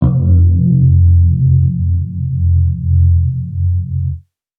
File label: Dusty Analog Bass F, Bass Guitar